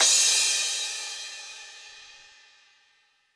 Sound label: crash